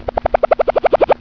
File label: pong